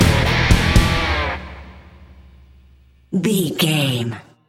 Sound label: bring pain stinger, Sports Rock, Hard Rock, Metal; Pop Rock; NFL; Football; Sports Music; Driving; Bikers